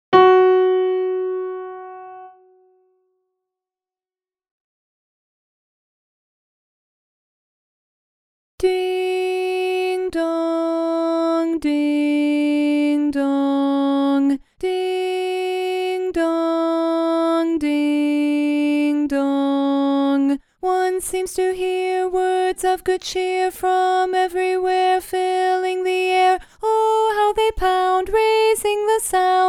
Lead Alone; Carol of the Bells; holiday music

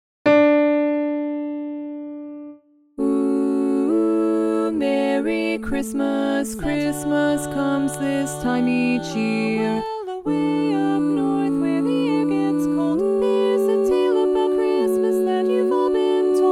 Bari Dominant; holiday music

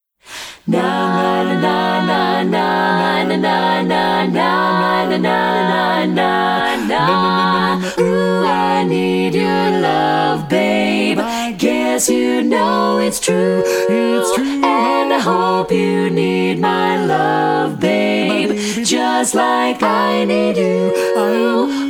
4 Part

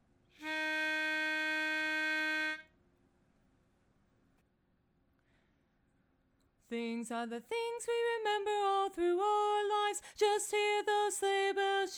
Bari Alone; holiday music

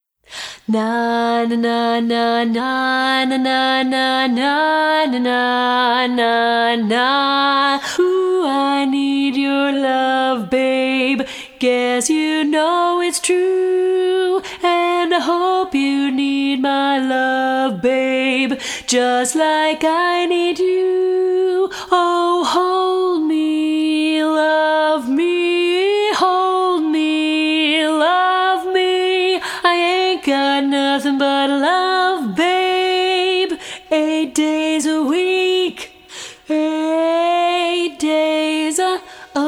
Bari Alone

